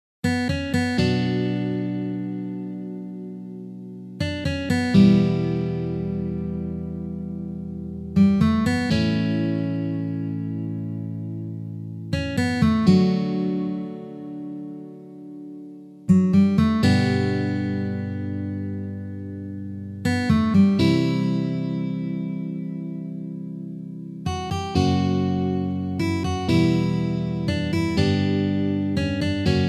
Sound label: Intro Slow Tempo, Guitar